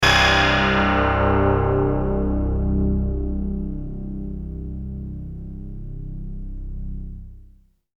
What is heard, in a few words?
laser harp